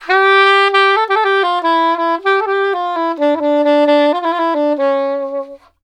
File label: SAX, Balkanish Traditions